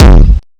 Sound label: Gated Kick; Yamaha PSR E305